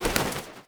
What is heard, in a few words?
wings jump, crow; critters